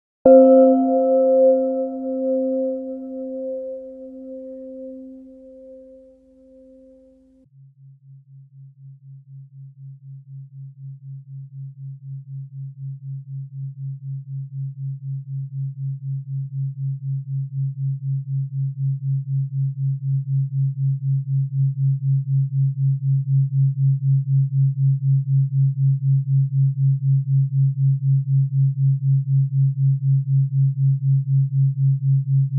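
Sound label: Binaural with Gong (136Hz); Meditation; hybrid; Binaural Hybrids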